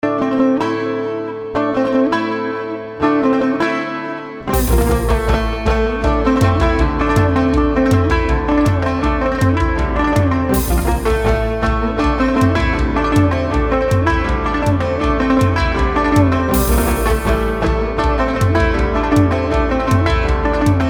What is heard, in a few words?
Ancient Cretan Battle March